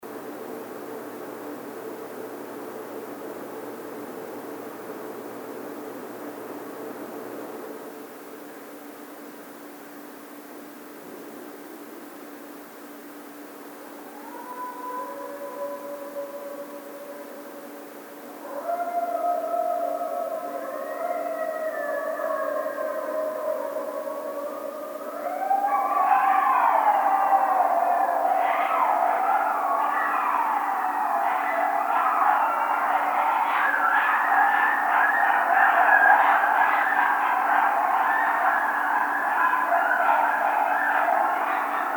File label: howl, Coyote